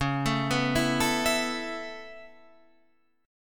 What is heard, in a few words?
B 7th Suspended 2nd Suspended 4th, chord